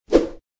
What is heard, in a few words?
bullet create; croods